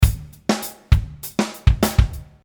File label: basic Loop Naturset